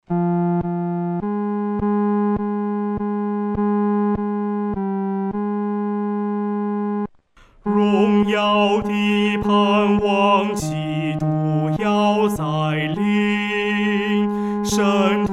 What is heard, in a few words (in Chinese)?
独唱 第三声